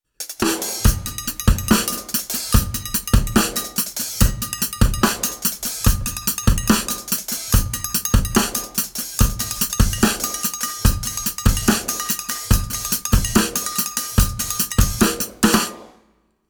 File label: Funk 144BPM